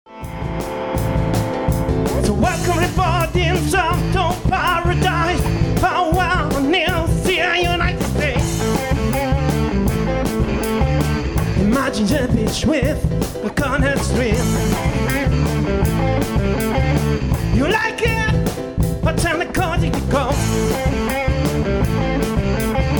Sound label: Meedley Live Streamin Blues